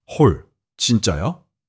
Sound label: surprise